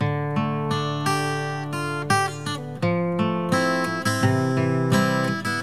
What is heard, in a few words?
guitar, Sad